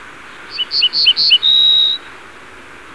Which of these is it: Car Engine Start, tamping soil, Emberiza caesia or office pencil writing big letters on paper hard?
Emberiza caesia